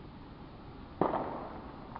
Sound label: ShotSpotter